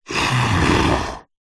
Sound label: horror yeti monster sigh breathy frustrated